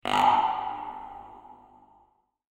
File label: grunt buck